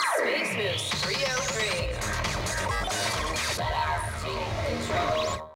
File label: Radio Electro Jingle; Gangstar Miami Vindication; Android; macOS